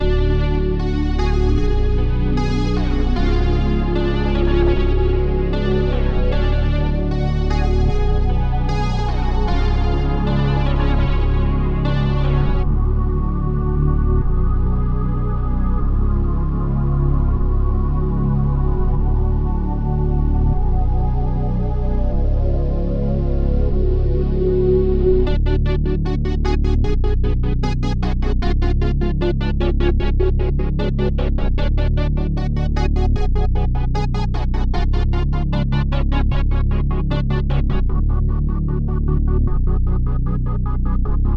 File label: 152 BPM F# Min, Hip Hop Melody Loops